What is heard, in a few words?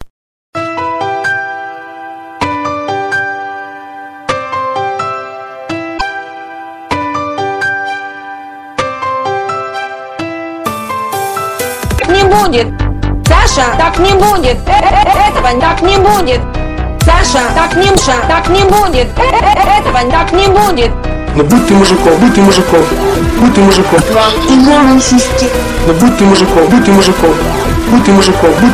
Infernal Dubstep Remake